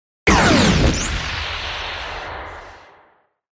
Thunder element

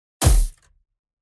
unleash arrow

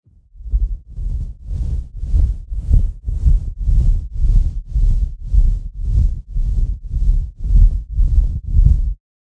baby dragon

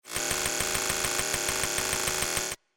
computer downloading sound

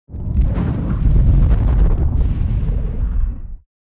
Fire element spell

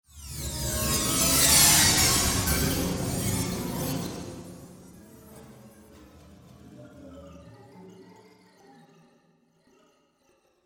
Horror

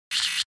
CAMERA ZOOM

